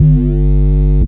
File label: Wah Square Bass; Basses